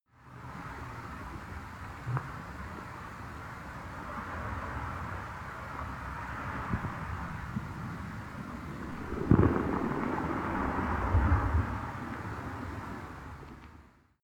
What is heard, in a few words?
abstract beach